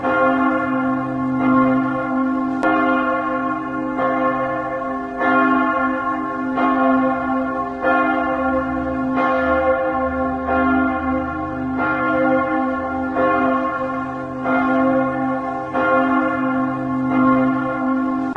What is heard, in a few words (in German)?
Christusglocke c1